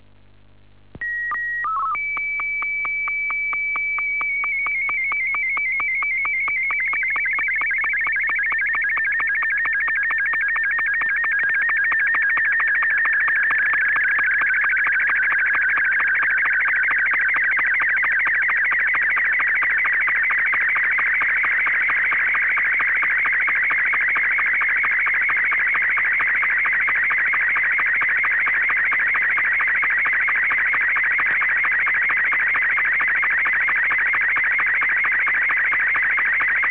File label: sstv